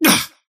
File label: parry fail; dota; kez